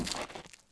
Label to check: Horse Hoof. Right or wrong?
Right